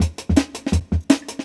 Jungle Breaks

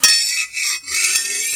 SWHIT, SWORDHIT; Total Control - Action Cutz

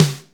PHATT SNARE, Hip Hop Kit; E-MU Formula 4000 Series Vol. 1; Hip Hop Nation

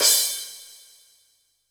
Crash Cymbal, Standard Kit, Yamaha PSR-E323

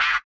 BUZZER; Spectrasonics; Metamorphosis

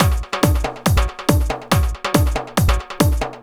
Addon Loop, Drum Loops 140bpm, Perc Loops

